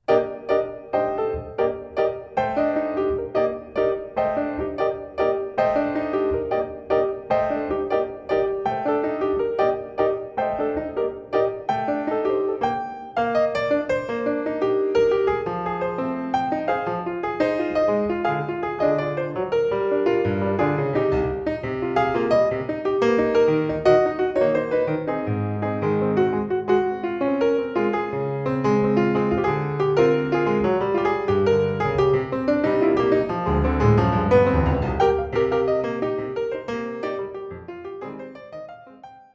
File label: piano